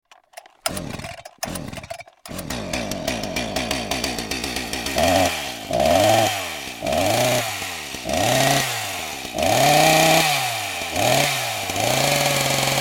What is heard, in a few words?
chainsaw sound